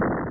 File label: EXPL; stryker